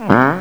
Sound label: fart